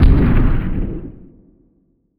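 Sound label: explode distant; hl1 weapon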